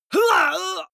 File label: male fight pain shout; vocals; comedy-cartoon; soundpool